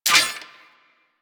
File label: Sniper Mech; Sentient; Crp Sent Amlg Sniper